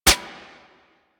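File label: submachine gun Shot; Warframe; Tenno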